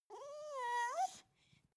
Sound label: dog whimper confused sad